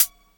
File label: HIHAT HIP; Best Service Dance Mega Drums